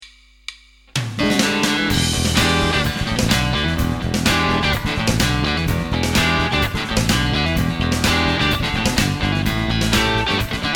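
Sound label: Strat Overdrive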